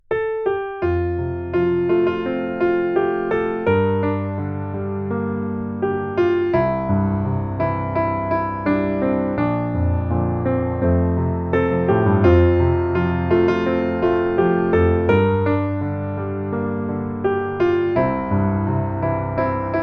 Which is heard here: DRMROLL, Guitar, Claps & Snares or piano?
piano